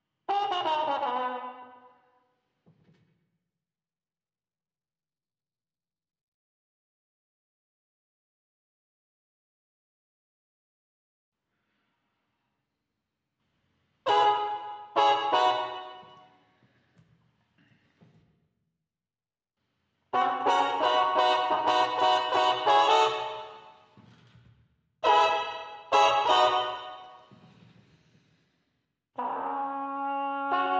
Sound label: Trumpets